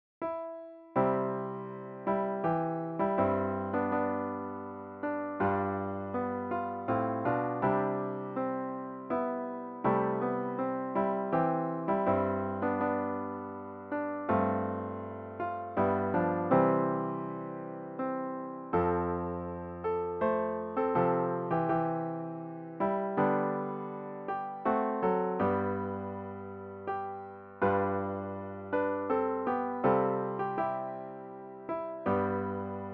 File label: Abide With Me Tis Eventide organ